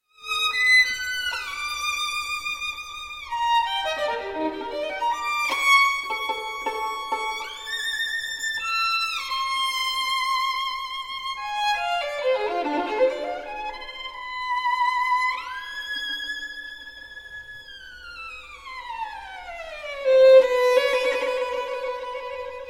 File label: symphony orchestra, violin concerto; Benjamin Britten; BBC Scottish Symphony Orchestra; op 15